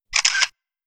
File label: Photo Camera Shutter